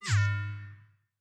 door deactivate

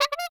shoot; CrossCode; boss; glitch-boss

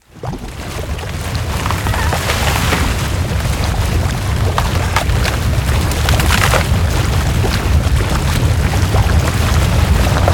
globber Pop; UtilGooGlobberMom

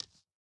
land

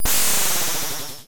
pop; World Of Goo

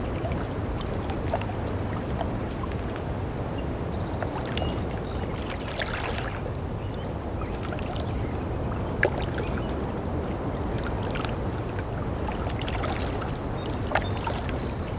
loop evening Water; World Of Goo

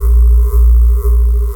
wave swell; CrossCode; battle

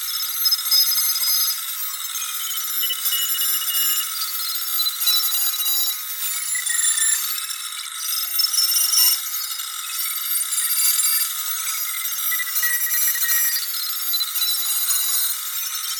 computer beeping; The Swapper

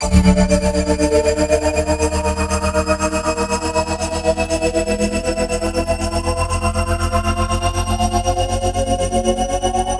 element shield duration

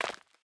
land concrete; The Swapper

